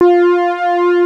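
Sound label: JP-8 STRING H; Greytsounds VOL-1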